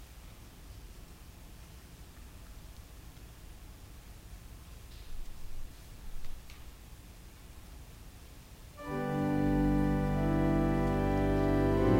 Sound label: Organ Music; Jesus' Mercy to One Taken with Palsy (2)